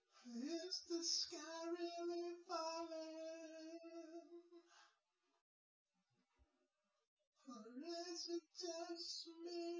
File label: nodrums synthconv 250ms 1m